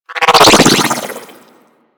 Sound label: Flak Drone Projectile By; Corpus